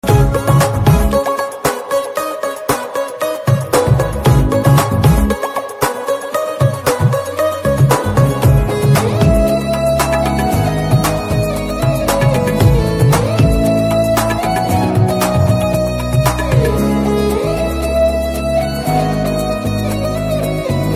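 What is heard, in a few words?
background music, Telugu Ringtone; Prema Katha Chitram 2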